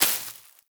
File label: Bullet Impact Debris Grass; Warframe; Weapons; Bullet Impacts